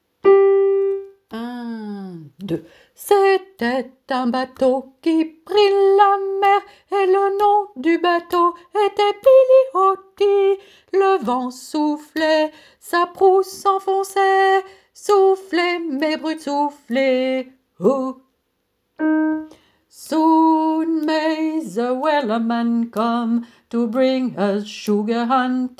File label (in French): Wellerman couplet refrain Hommes